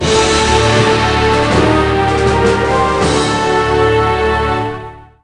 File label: Jingle Win; Jewels of the Ages 2011